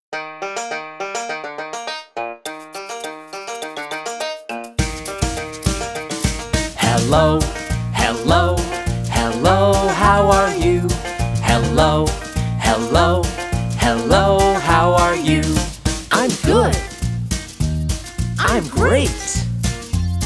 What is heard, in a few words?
Kids Greeting Song and Feelings Song; english poem for child